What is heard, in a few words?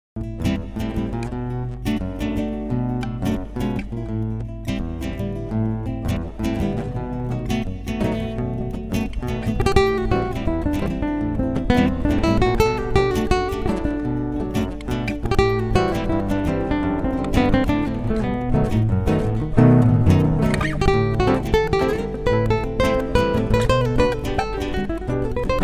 rumba